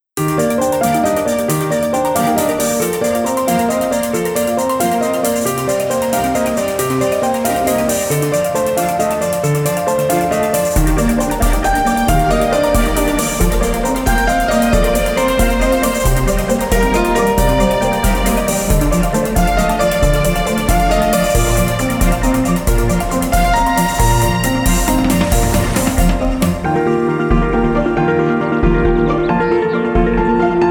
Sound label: Musique 136bpm CORDES